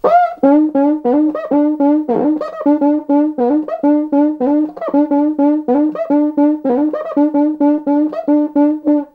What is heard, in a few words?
Cuica